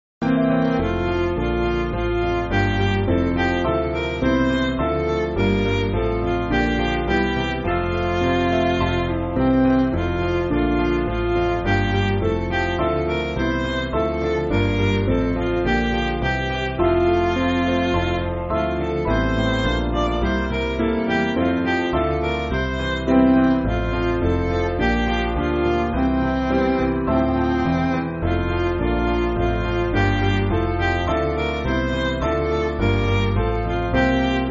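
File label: Midi, Backing Files for Churches; 4/F Chord Sheet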